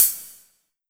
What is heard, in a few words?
hihat